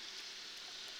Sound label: Background Noise Clips